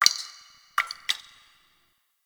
Ocean Interior Drips; Warframe; Grineer Ocean

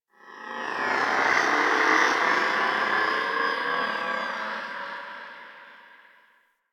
Railjack exterior tonal textures; Ambience; Warframe

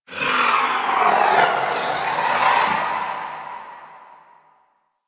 Sentient Lair Exterior Creature

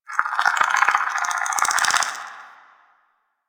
Cave Creature, Ambience; One Offs; Creatures; Warframe; Quests; The New War; Drifter Camp

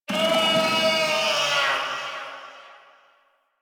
catbrow feral vocal spawn; Warframe

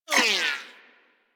Laser Whizzby; Warframe; Weapons

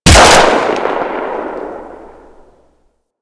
Drone Machinegun Fire; Warframe; Vay Hek

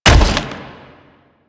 Step; Warframe; Sentients; Erra; Foley

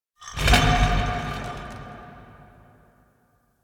Ceramic Rumble; Warframe; Ambience; Entrati

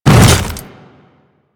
Hammer Boss Step Ice, Footsteps

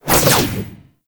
Backflip Whoosh; Foley

